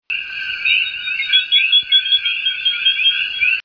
eleutherodactylus whitmanae maricao state forest puerto rico; A chorus of them somewhat resembles wind chimes, as the utterance of one male elicits all th; West Indian